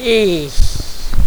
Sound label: geehh